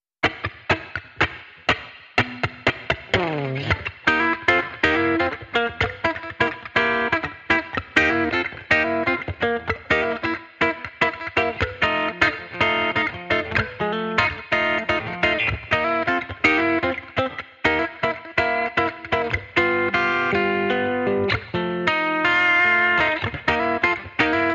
Electric Redneck Guitar Only

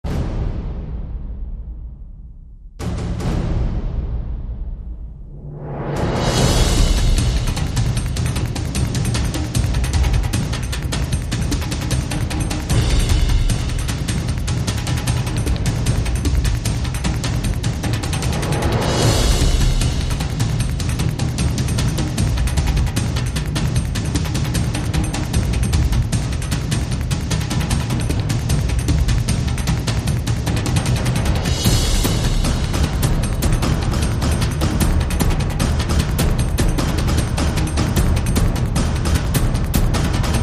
Dystopia Drums Percussion

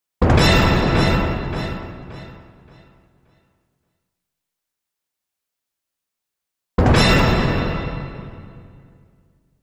Stabbing Hit

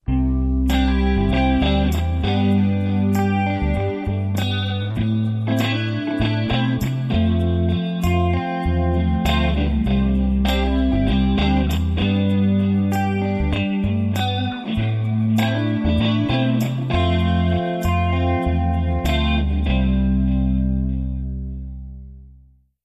Easy Cut No Drums

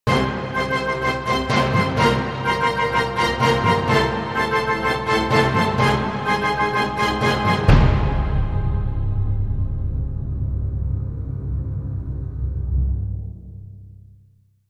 Giant Footsteps

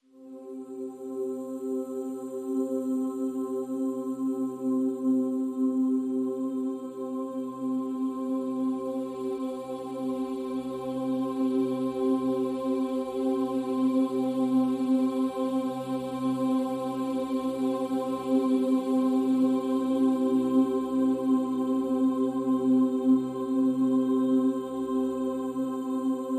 Pads Vocalicious (C)

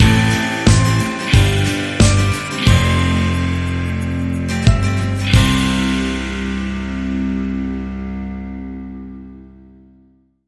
Gently Woken, Sting Upbeat

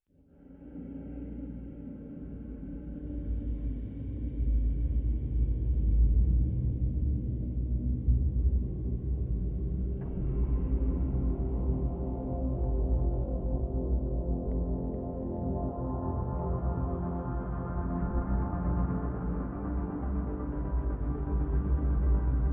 Drone

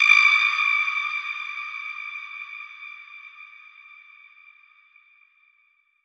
Ping Shrill

